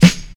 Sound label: full acoustic snare f key; snare drum samples